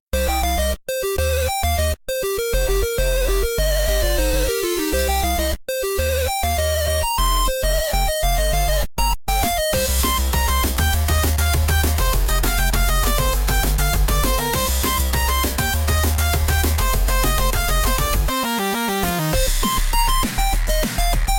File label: music background